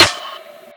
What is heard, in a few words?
RIM Noise; Sappo